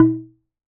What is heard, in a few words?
Wooden Bar Strike